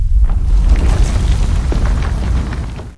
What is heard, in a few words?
quake